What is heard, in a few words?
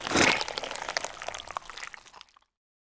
squeeze, headcrab gland; creatures; headcrab classic